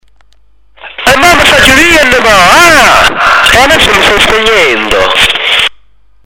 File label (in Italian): spegnimento